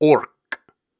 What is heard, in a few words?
Syllables and Imaginary Words, Viking Vocal, Hard